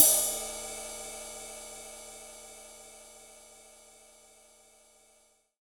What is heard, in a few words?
subtle reverb crash d sharp key, Cymbal; drum sample